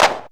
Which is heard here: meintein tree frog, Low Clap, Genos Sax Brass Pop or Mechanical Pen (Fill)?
Low Clap